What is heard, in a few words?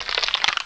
Scroll Page; ESAN VO Sound Schemes; ESAN MK Sound Packs